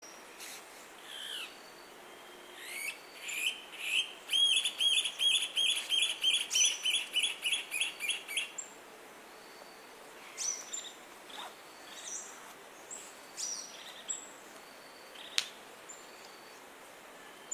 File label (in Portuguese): Canto do macuru de barriga castanha